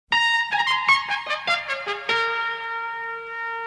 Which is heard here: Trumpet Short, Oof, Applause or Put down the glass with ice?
Trumpet Short